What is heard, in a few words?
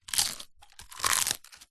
human eat bite crunch papadum